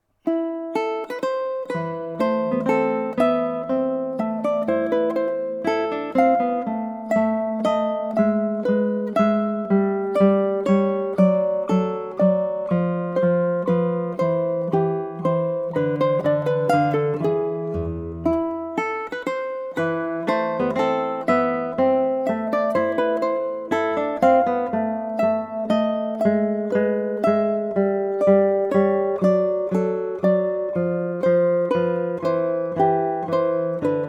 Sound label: Menuet, Guitar and Ukulele; BWV Anhang 120; JS Bach